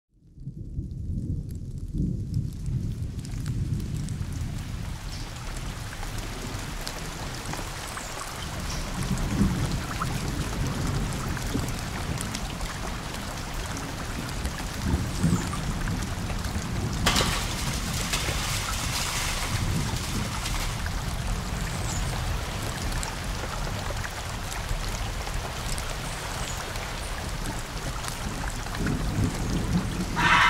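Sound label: 3d sound of rain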